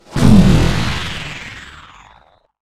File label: Gun